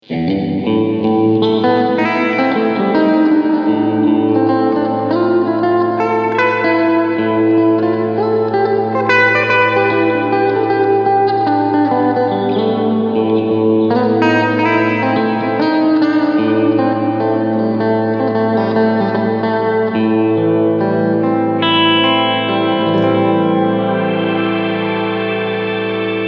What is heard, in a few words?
Plate Reverb